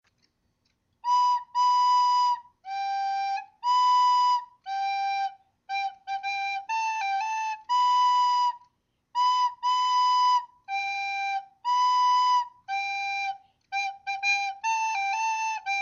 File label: Recorder Music; Music Fest